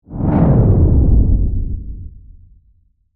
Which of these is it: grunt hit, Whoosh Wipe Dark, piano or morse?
Whoosh Wipe Dark